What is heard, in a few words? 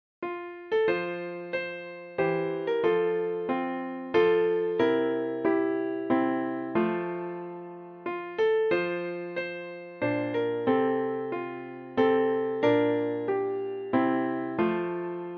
sans day carol piano; christmas music and carols